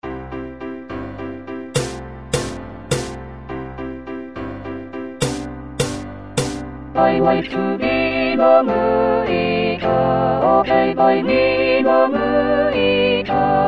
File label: Tutti; west side story